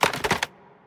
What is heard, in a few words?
mvt armor; smite; arthur skin9